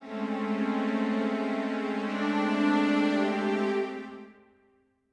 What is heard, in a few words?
strings; undead overlord linux macos windows gamerip 2014